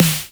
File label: snare analog